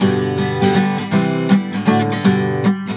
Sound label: GTR6, Celtic Flavours; USB Soundscan vol.42; AKAI